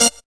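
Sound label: hi chord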